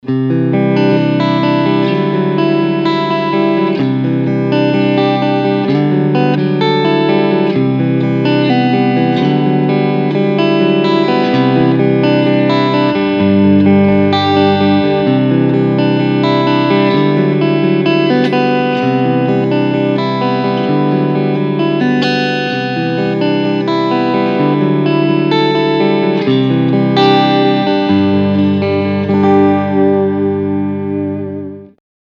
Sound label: Clean Picking Front SC